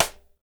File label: SIDE STICK, STICKS